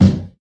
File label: mid range kick drum single shot g key, Royality free kick drum sound tuned to the G note